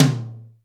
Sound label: DRY T, drum; Crypton; DrumBase5000